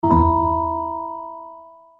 Tuneful Note

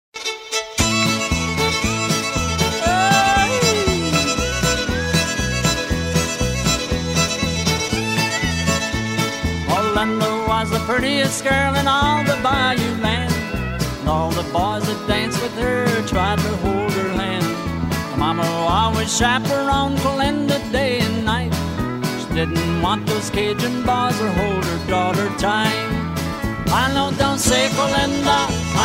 cajun

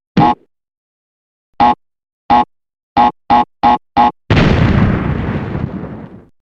Crash TNT

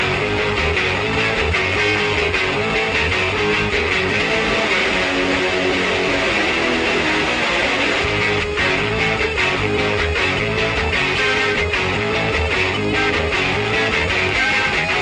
Grunge Guitars

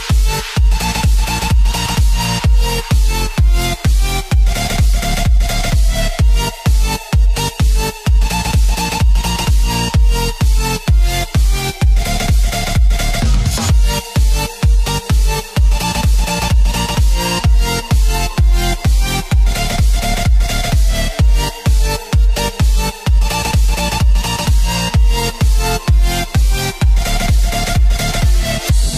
Electro House

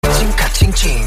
KA-CHING

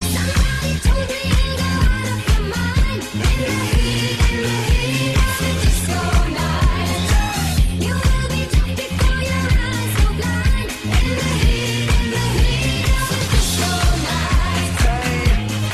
Disco Spirit

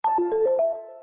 Windows Phone Sms

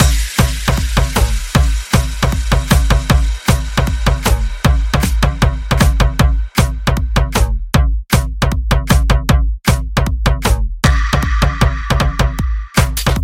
Hardbass

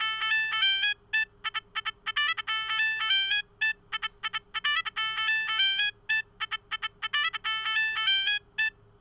Groove

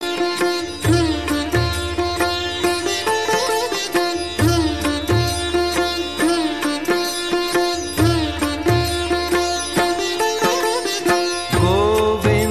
instrume

